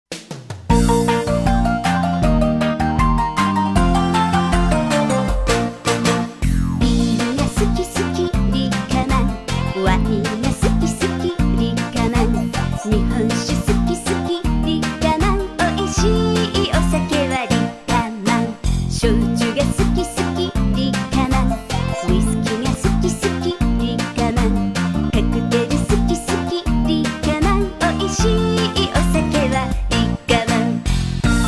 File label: melody